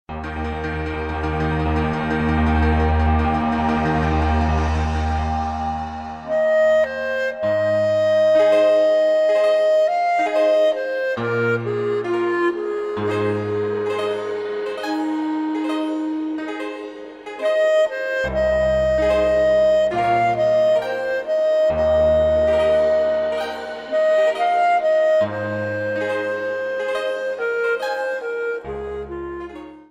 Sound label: blue grass